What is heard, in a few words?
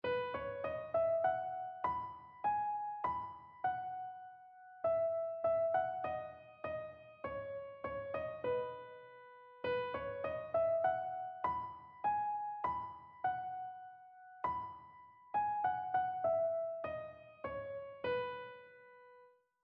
mode identification